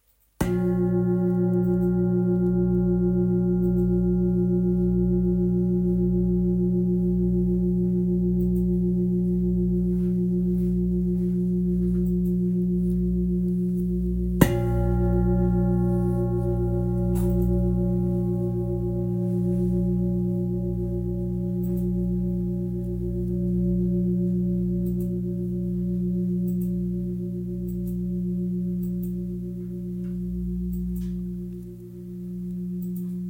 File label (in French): Gros bol la diese 10.61kg; Bol chantant gros volume 4kg et +